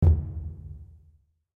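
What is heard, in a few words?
spin button; rome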